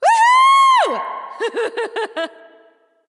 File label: nv yay; smite; awilix